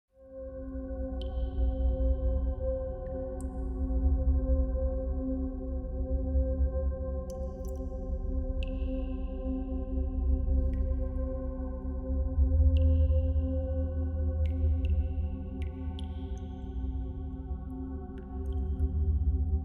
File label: forgotten cave